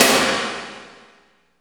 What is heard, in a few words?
SNR, Tekno Industrial; East West